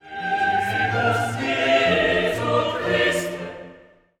Gloria Quoniam step

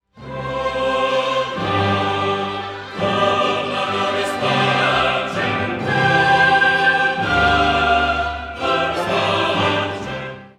Agnus Dei Dona chorus

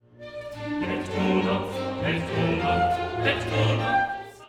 Credo Et unam sanctam

